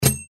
reel spin click; Lotus Lamp

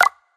reels start; Sizzling 777; wazdan

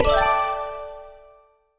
text appear; Racetrack Riches; megaboard

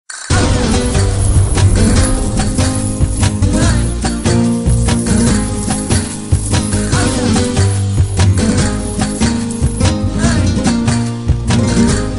WIN MAX; Flamenco Roses

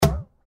reelspin click; Jelly Mania

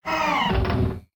UI AUTO STOP; Russian

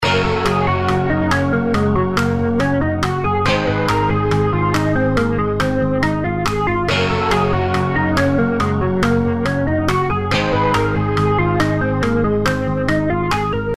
muscle cars outro bgm

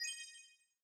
coin get; Thunder Fighter

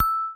gamble mouse over; games; Corrida Romance; Wazdan

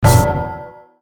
bonus chance; Grand Tiger

